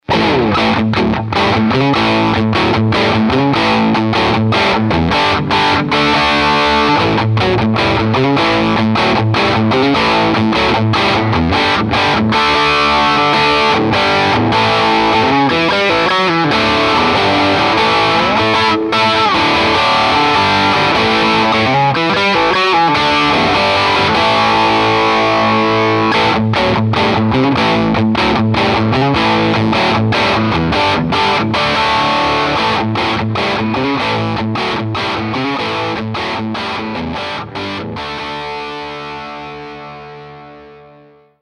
AC30C2 Top Boost Channel Cranked Single Coils, amp